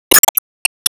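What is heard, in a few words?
Sound Effect Special Bomb; Se Sp Bomb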